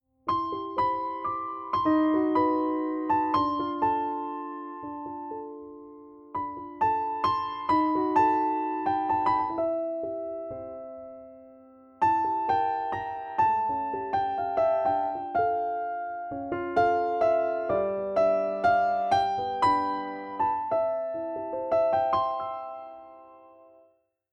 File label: Close Mics